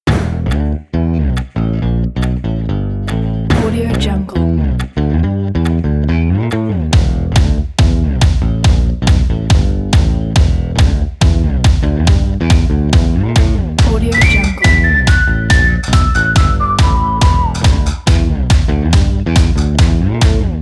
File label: upbeat funk